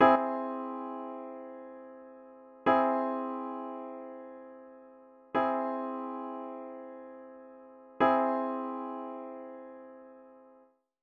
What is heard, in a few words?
C Augmented Repeated